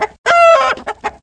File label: Chicken